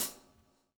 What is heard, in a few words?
SLICK, Backbeat